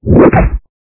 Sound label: bear upstrike